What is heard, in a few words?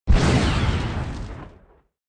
Ball OFF